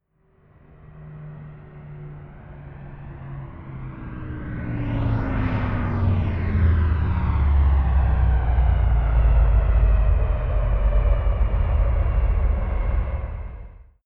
plane bombardier learjet flyby left to right distant